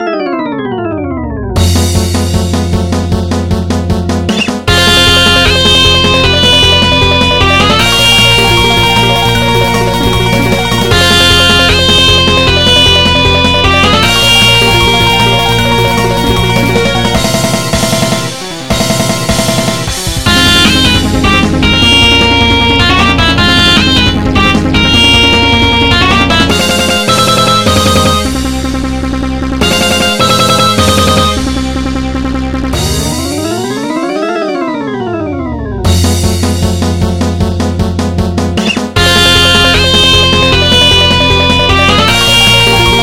Battle Music

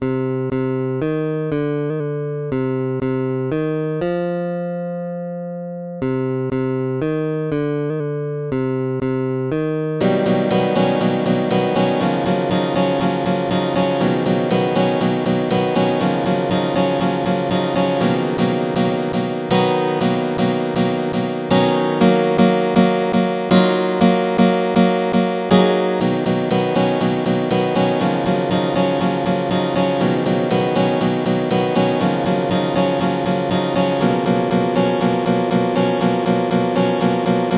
Rough Riffs

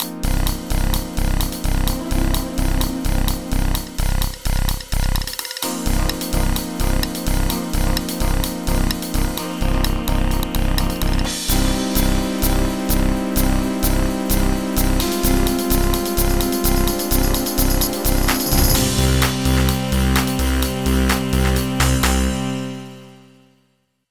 Trance

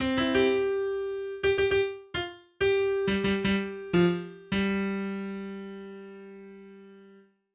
Battle Call

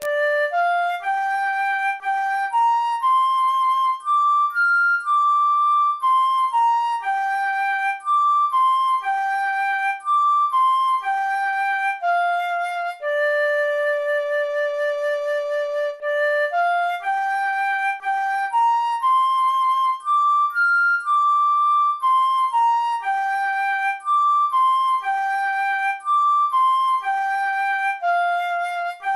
flute; to love end

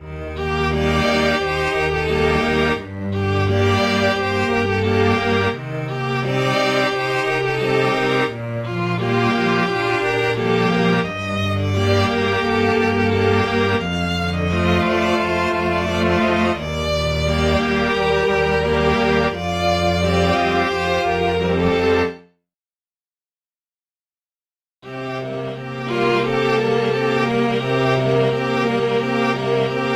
for String Ensemble

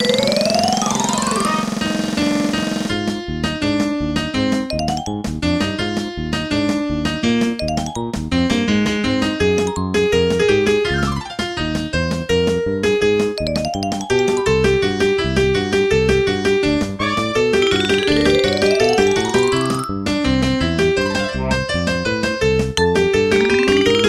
Karaoke

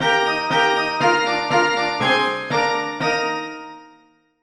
Game Over

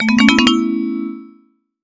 Start Coin

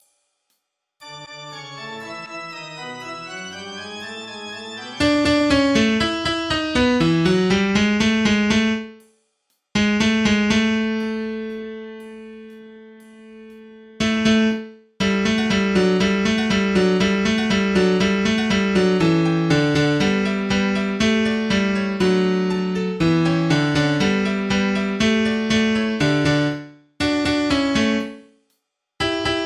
Beethoven 9 Bass

